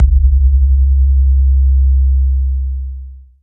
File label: distorto, Kicks Heartbeats